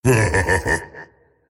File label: laugh; tiny